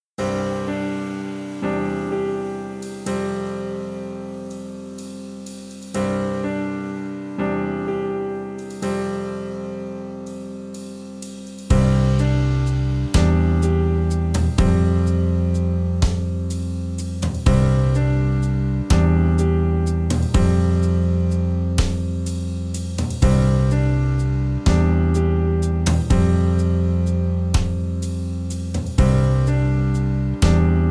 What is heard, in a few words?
Play Along, trompete; easy beat vol 1 trompete